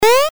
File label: jump